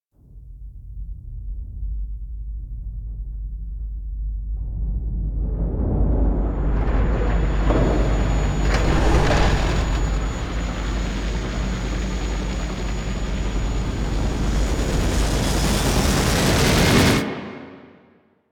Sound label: Dropship Depart B; Warframe; Quests; The New War; Narmer Outpost